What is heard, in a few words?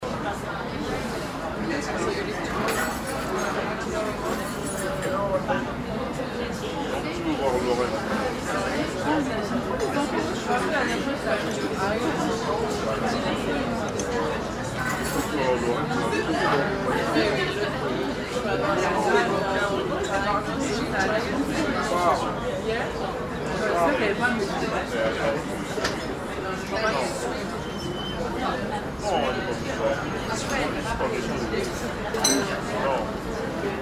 paris cafe